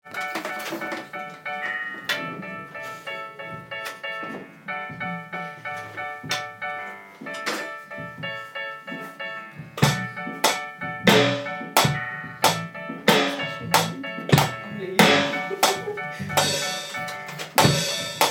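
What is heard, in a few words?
my drum piece practise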